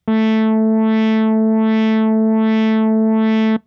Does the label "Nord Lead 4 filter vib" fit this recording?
Yes